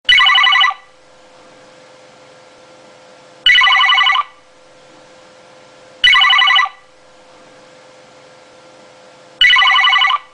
Telefon; Ludolfs

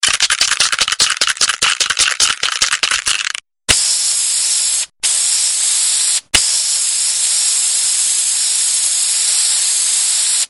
Graffiti Spraydose

